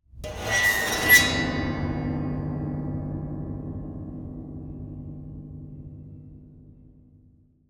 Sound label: piano 1805 gesture strings resonant scrape, Prepared Piano, upright piano